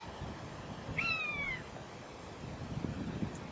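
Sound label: common buzzard; ullapool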